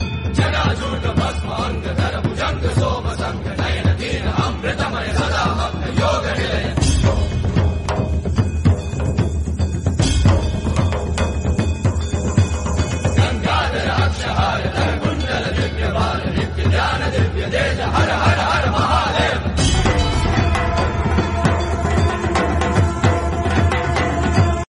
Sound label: south devotional